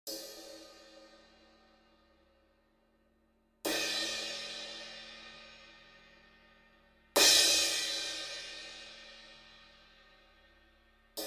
Crash Cymbal 18 - Plastic Rutes

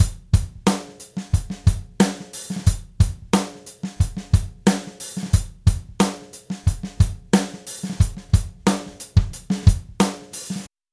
Acoustic Drums; LF Max Punch

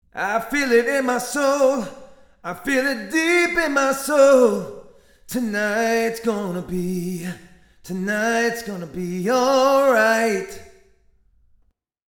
Reverb - Vocals - Bright Micro Room; CRTIV Reverb

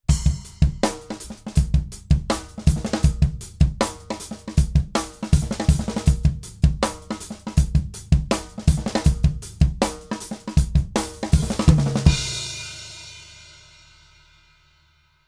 Breakbeat Rhythm

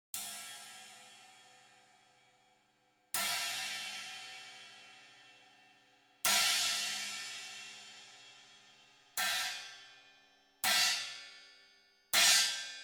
China Cymbal 18, Birch Rutes; China Cymbal 18 SB-APX-M - Birch Rutes

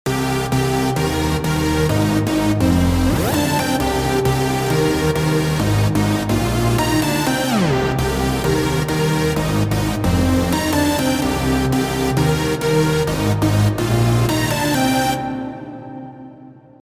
Reverb - Synth Lead - Muffled Space; CRTIV Reverb; Synth Lead 1

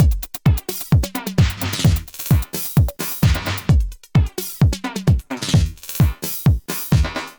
Beat - Tape Drums; Transient shaper audio effect plugin; Crunchessor

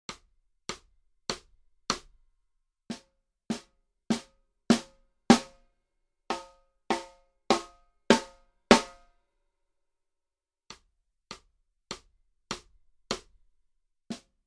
Snare Drum 1455 - Hickory Sticks

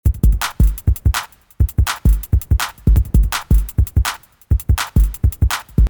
Beat - Windy Pad; Shumovick; DaZone Beat

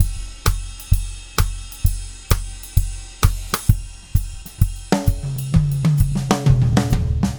Acoustic Drums - Pronounced Drums; Crunchessor

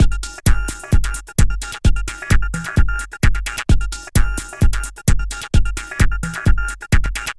LF Max Punch - Beat - Full Saturation

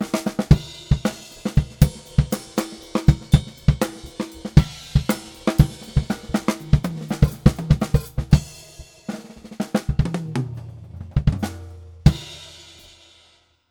Acoustic Drums - Ploppy Drums; TransGainer

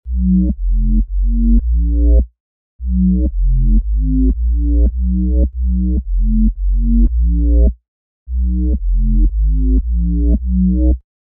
Chorus - Bass - Damped Chorus; CRTIV Chorus; Reason Bass

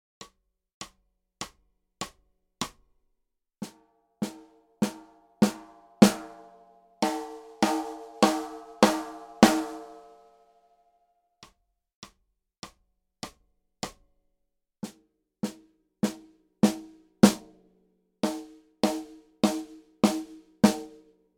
Snare Drum - Hickory Sticks

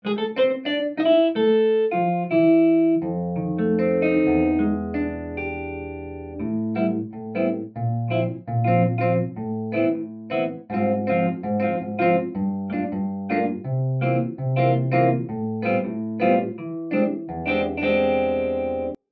Jazz Guitar - Hybrid Space; Spatifier

